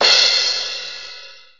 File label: big crash cymbal drum sample c key